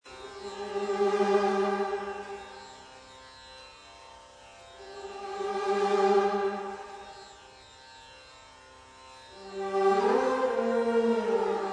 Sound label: INSTRUMENTAL